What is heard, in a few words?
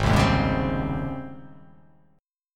G sharp Major 11th G sharp; 4,3,1,1,1,2,2,x; JGuitar